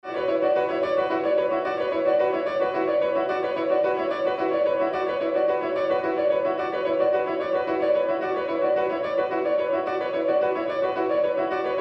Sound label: Piano Phase